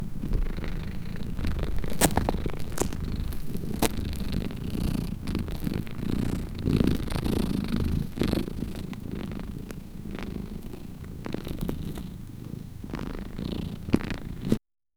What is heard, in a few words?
stepping on a cats tail, animal